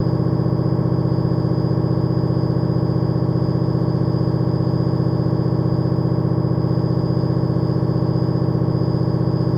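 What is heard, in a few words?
sounds of MRI tomography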